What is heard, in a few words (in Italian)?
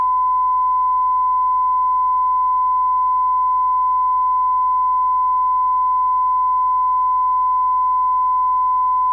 Calibrazione 1Pa